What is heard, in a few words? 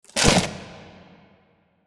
kaiju adult chomp; biogenesis